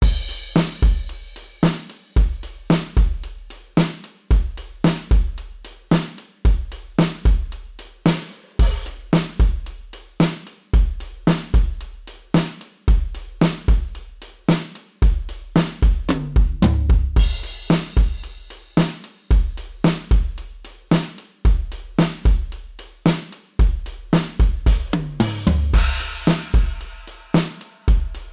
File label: modal surf, drums